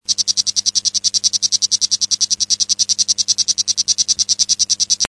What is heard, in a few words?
escudo; Oophaga